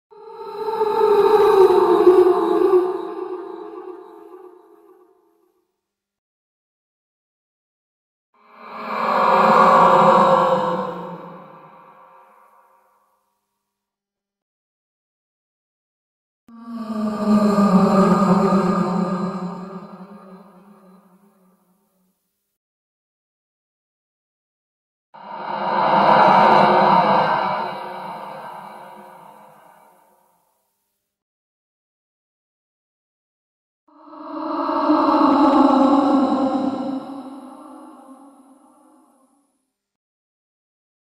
suoni fantasma effetti sonori horror sounds horror scary ghost